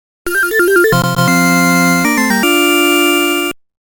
Power Up BGM Lv Change to 2, soundtrack; TwinBee; arcade

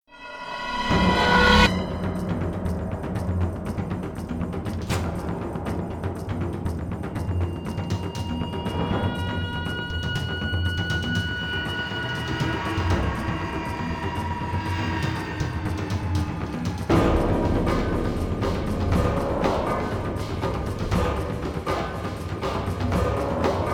combat loop alt; F.E.A.R. 2: Project Origin

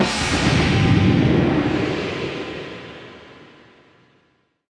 Fanfare; the legend of zelda skyward sword wii gamerip 2011

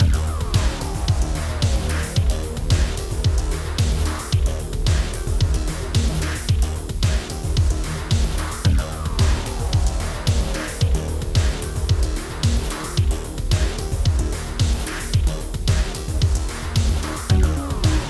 Combat; Project Remedium

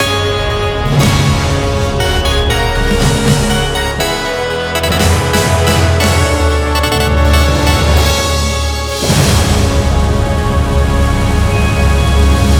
Game Complete; Shovel Knight Pocket Dungeon; Android; PS4

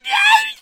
scream

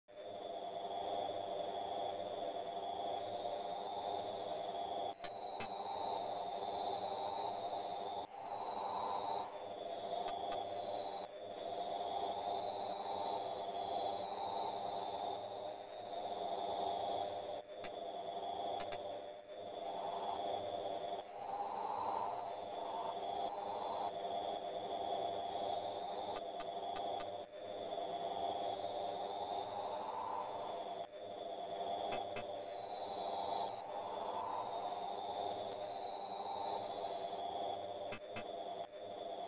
Atmos; Valhalla

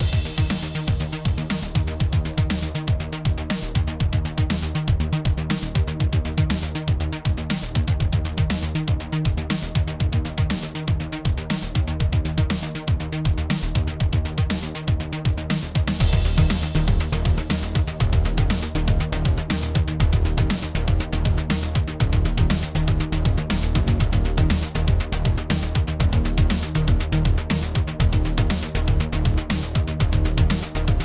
Combat; Project Remedium

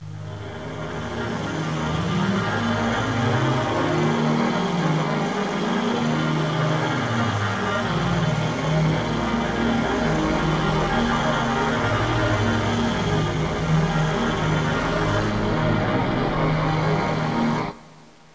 Pad; Great Eagle 2

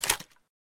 Shotgun Insert; criminality

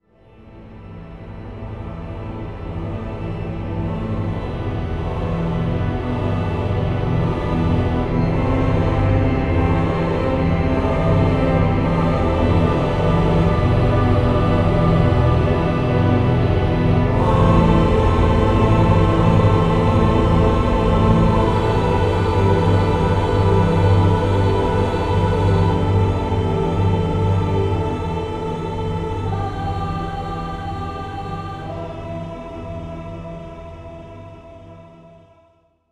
Lullabye

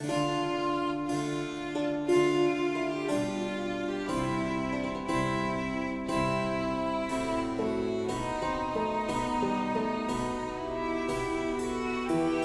Intro Music; Shadowhand; macos windows gamerip 2017

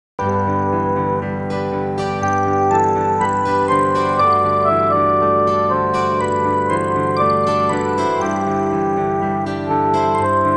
Farm Music; My Little Pony Friendship Gardens

